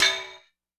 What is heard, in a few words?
Frying Pan Hit; Sonic Foundry (Sony Creative Software); Joe Vitale Latin Percussion